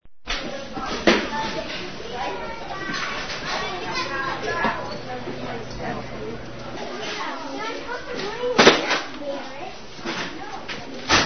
SCHOOL

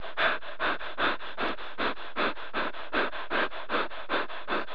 people

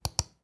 Computer Mouse Click

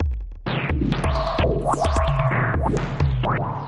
drumloops

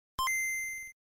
gameboy

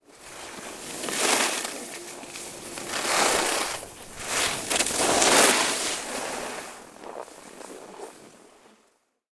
Skiing

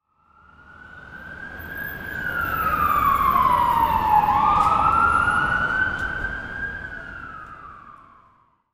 Police Car

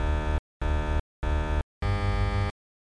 videogame fin

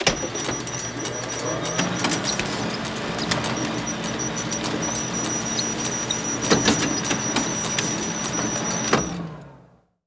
machine photocopy

